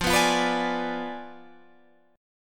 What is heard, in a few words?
F Diminished 7th F down Guitar Open G